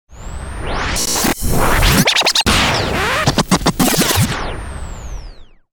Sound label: Reverse Fizz-plosion; DJ sounds